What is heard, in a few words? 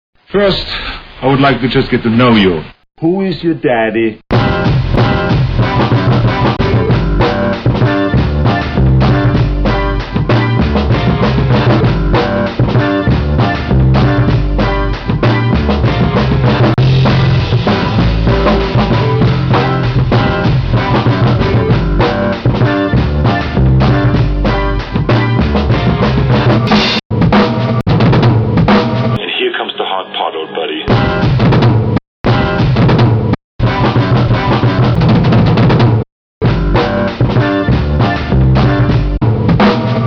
riff jam remix; quadz; quake